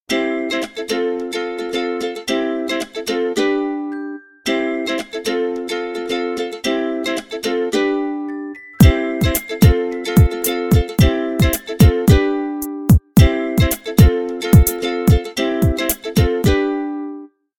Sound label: Ukulele, ringtones; Infinix; XOS 15